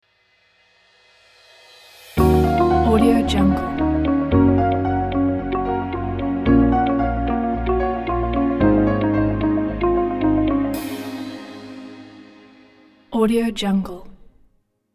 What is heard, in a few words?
calm chilling ident